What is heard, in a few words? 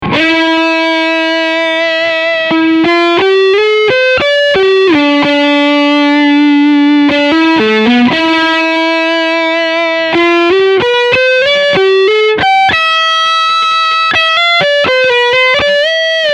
Leadsound 2